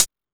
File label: Techno, EMU XL-7